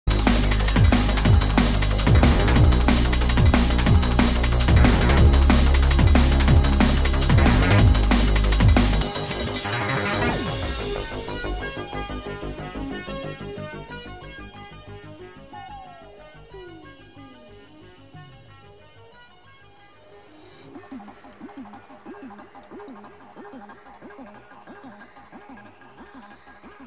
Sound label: Drum and Bass Jungle and Old Skool, UK Bass Radio